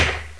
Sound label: Close